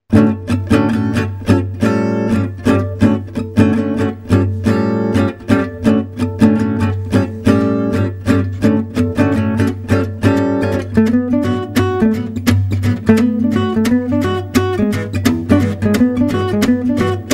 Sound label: Manouche; MyVirtualBand